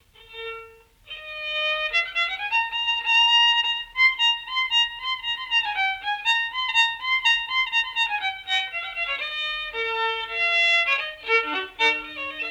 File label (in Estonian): Ruhnu viiul folk; Lihl Peter; Vaike Peeter